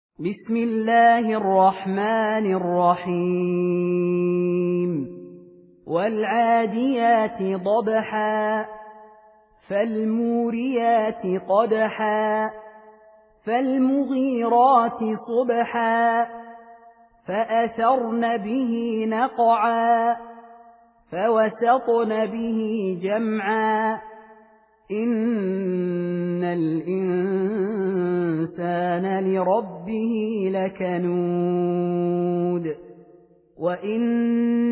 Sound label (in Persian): سوره عادیات; خالد المهنا